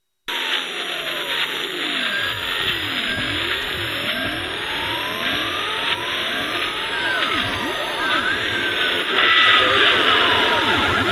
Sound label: fm noise intro